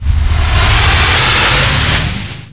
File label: psshit